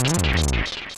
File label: SMAAAASH!; mother2